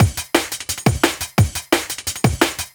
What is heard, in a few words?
DNB BREAK